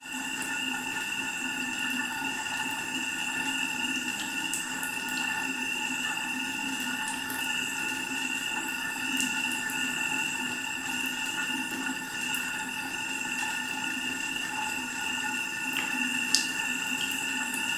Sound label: bath tap broken leaking faucet dripping hissing